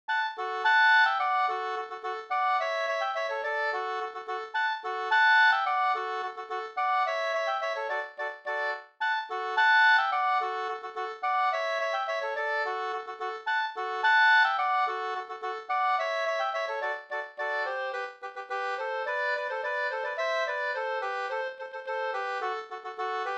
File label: polka